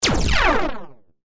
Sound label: laser gun; افکت صوتی